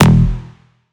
bass shot e

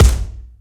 kick hiphop stomper

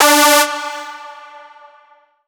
drop synth shot d high; dinosaur

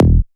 bass pluck e

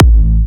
kick a

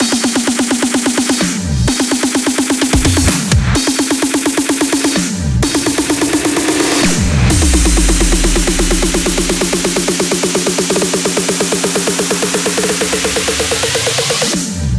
128bpm long fill

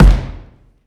kick hiphop stadium

